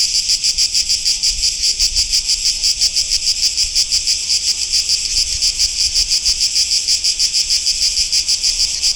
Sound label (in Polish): dzwiek cykady